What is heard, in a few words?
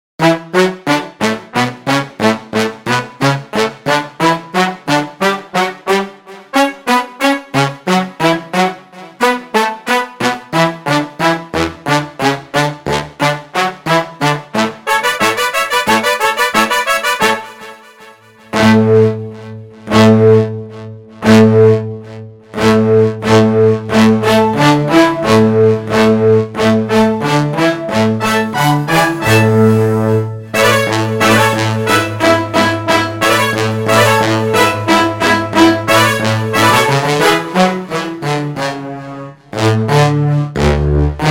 Fanfare; VivaValdi; besondere Fanfaren